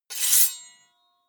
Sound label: scrape